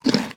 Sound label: eat, horse; minecraft